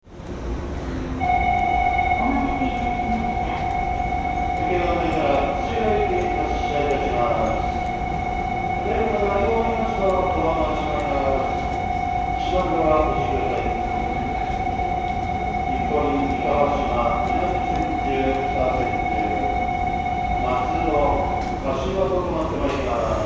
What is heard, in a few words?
E500, ueno, rail